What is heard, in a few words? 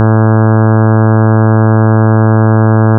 Additive Synthesis, Basic Waveforms